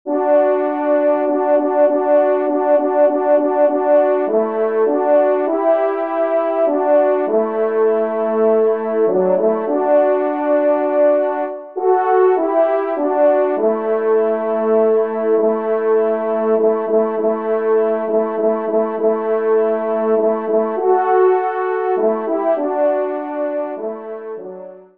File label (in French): Offertoire TRP; Messe d'Estival